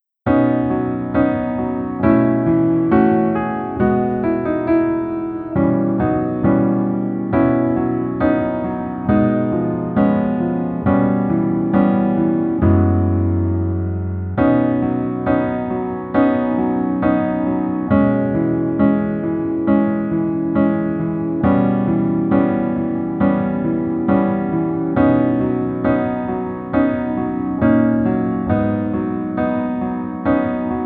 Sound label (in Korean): Piano ver -1키